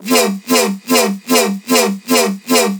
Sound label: BASS SHOT Wowowowow